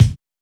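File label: bass drum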